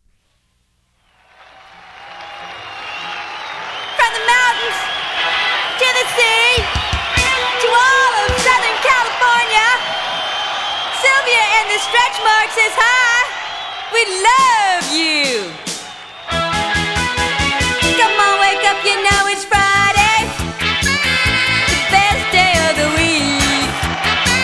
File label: RockNRoll